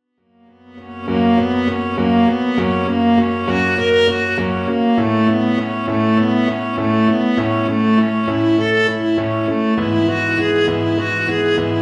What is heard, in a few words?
tango argentino vla kl